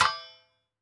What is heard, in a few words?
mine metal